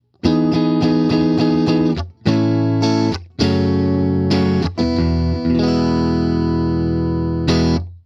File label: Stratocaster Middle and Neck